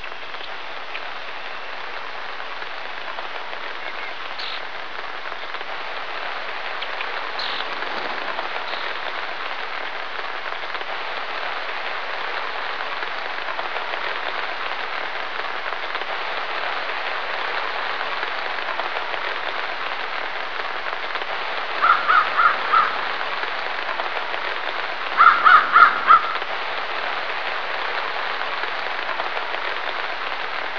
rain

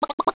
chicken

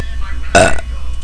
burp

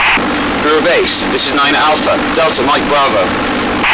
radio

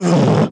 zombie pain; mystic radar; mr zombie1

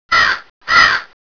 raven; colonial

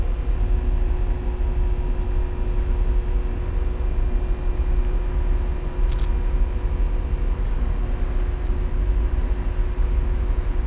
transformer sound

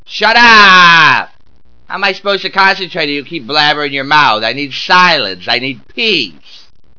zombie; de compclub

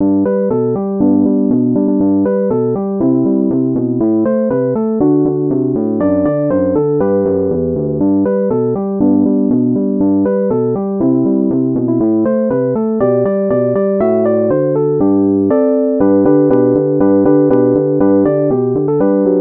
jingle

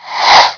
slice

